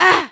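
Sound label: woman ugh; WhiteOut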